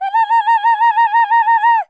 fem vox trill, female singing; VOCALS and SPOKEN WORD

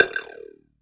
cyborg Ruler; Cyborg Kit

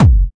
electro kick; STYLE KITS; Hip Hop; Boy In A Band Electro Kit

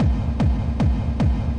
151 ambient kick loop; 141 to 160 bpm

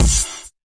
fx drum, Electro FX

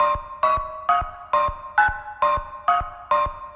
cold in the sea hits, synth and electronic loops; melodic loops

